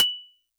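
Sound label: Xylo Snare DX100 F sharp 4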